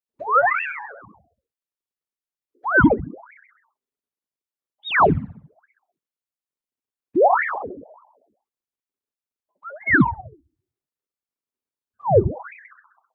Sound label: TONAL Filter Creature Vocal Short; boom library; Anime Essentials